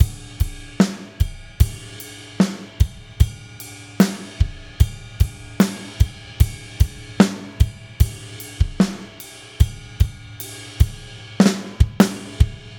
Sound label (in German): drums normalisiert ny fet compressor